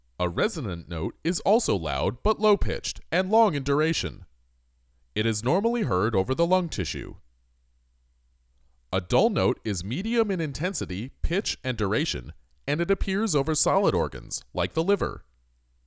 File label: Percussion